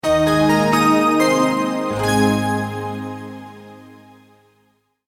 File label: multimedia logo, Musical Identity/Signature; Sonic Logos; Sound Design; Short Musical Signature / Ident